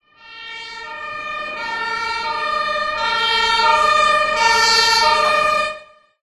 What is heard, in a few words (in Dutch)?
Brandweer sirene; ZhenShiXunYouJi2